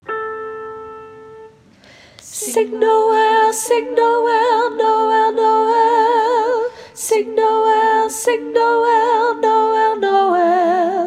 Sop Lead

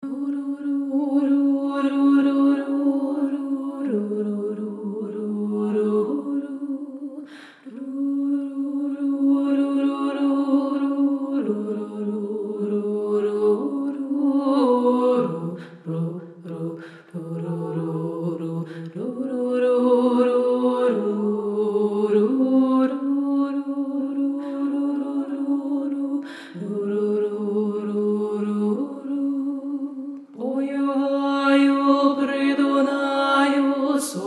Tenor Bass; Oi u Haiu

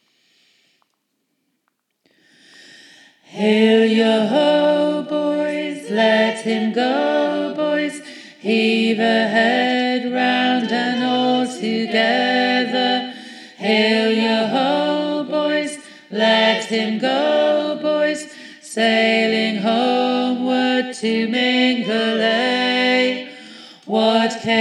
bass 8ve up; Gospel Choir; mingulay; Whitby Songs

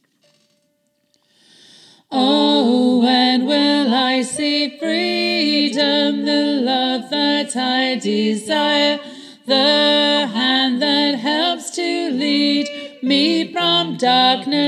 bass octave above; Gospel Choir; freedom; Whitby Songs

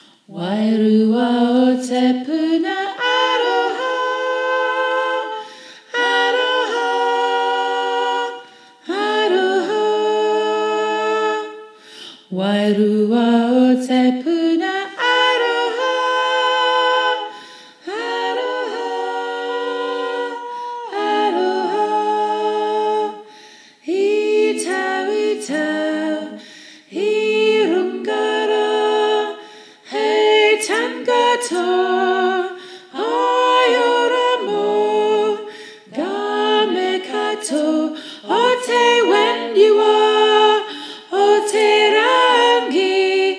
tenor 8ve up; Whitby Songs

